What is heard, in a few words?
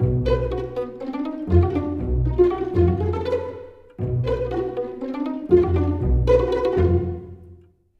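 jungle world music, joyeux baston pizz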